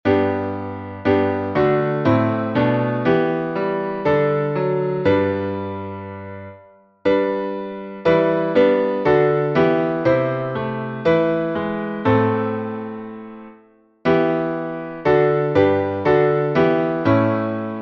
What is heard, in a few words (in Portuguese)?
salmo playback